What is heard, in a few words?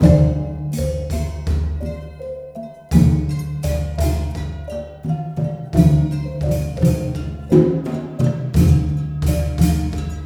MALAY R, Heart of Asia